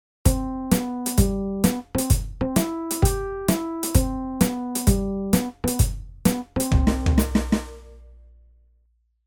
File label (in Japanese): ジャズホルン